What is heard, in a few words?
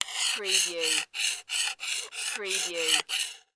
Knife Sharpen Multiple; Sound Effects Bible